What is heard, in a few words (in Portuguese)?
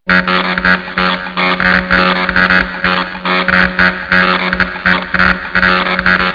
robo; 7invasores